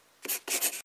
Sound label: Diary Entry